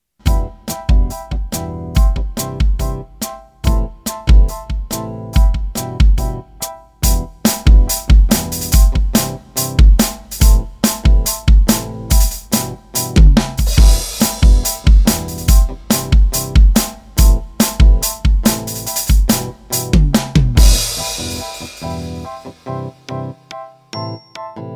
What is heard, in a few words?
Behringer XD80USB Playalong Groovy